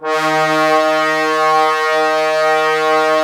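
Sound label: brass trombones D#, Woodwind Brass Ensemble; 90 s Sample CDs; Miroslav Vitous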